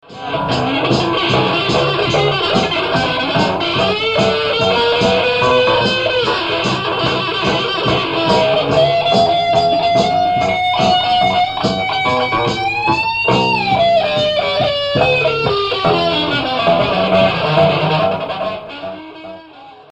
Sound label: overdrive, guitar